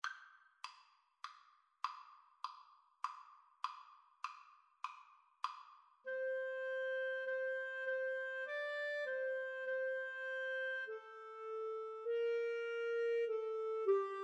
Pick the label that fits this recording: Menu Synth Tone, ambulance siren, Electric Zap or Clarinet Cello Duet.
Clarinet Cello Duet